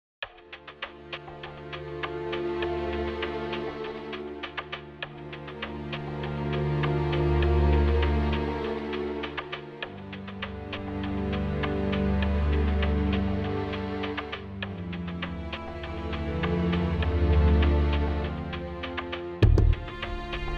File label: suspense electro, orchestral